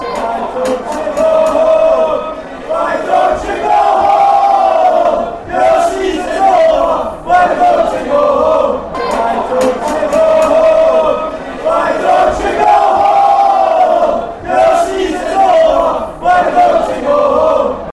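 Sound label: go home livingstone, football chant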